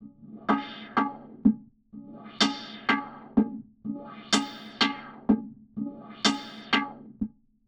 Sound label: Prc Fx Loop 125; Equipped Music; Loungin House; Perc Loops 125